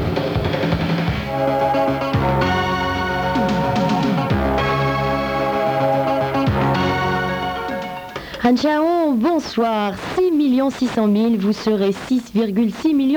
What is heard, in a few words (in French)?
Jingle WOUHAAH; Anti Jingles et Curiosites